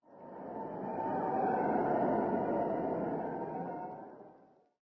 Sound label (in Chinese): 一阵呼啸的口哨风声